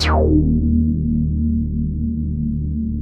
DEEP BASS; Masterbits; Dance Trax

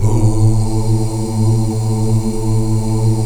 afro ooo, choir oos; Spectrasonics; Heart of Africa 1

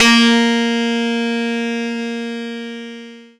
PLUCKED, Synclav FM, A#; Big Fish Audio

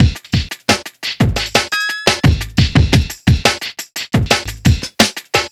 DJ Beat, Drum N Bass; Sonic Foundry (Sony Creative Software); Knutrix Drum & Bass

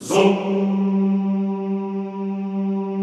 ZOOMMM G2, Classical Choir; Best Service; Extended Classical Choir

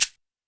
TR626 Shaker; Roland SH-32; Drumset 03

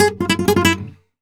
e, Flamenco Guitar Picado; Picado 1

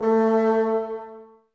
Bassoons A 3; Miroslav Vitous - Woodwind Brass Ensemble; Bassoons Links

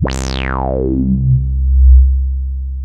BRASS C2; CMP - Analogon; FLORGAN

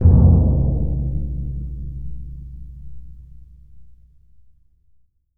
TMP C#, Synclavier Percussion

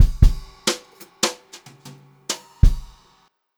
jazzy fill, Brushed Drum Loops; USB Soundscan vol.39; AKAI; 90 s Sample CDs

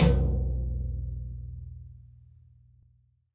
TD-20 Tom B, Roland TD-20 Toms

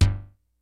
SEQUENCE BASS; Kid Nepro; Elite

